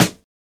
nice snare drum f sharp key, acoustic snare tuned to the F# note